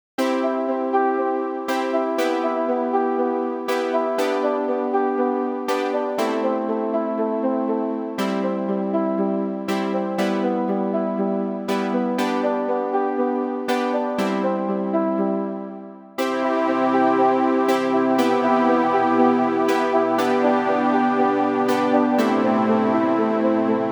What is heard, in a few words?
Recorded Zee (inst); NewJayTracks